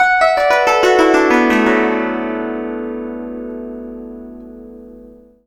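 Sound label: guzheng; Spectrasonics; Heart of Asia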